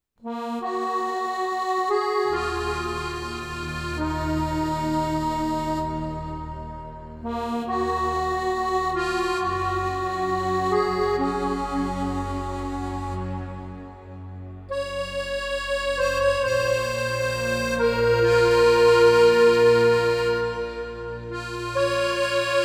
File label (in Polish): akordeon; Muzyka Ilustracyjna